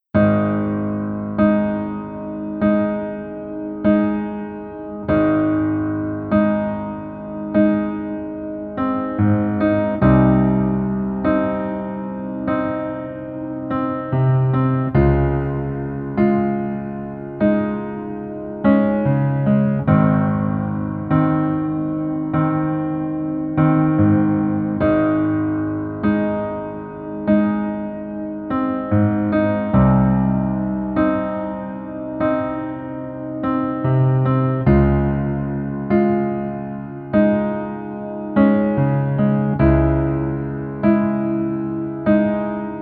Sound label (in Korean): Piano ver 여자키